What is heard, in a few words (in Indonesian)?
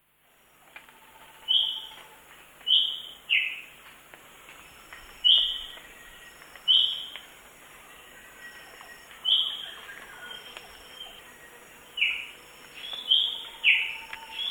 suara kicauan cucak hijau mini di alam liar